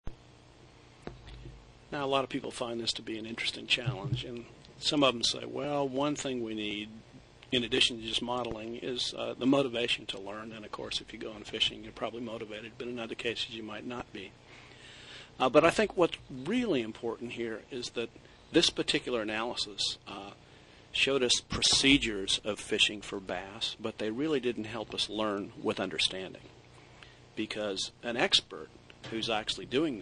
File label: bass